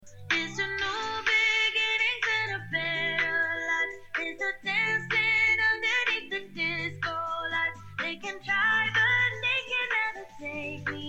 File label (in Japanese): 音楽録音